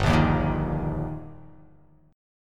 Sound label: G Minor Sharp 5th G, chord; strummed; JGuitar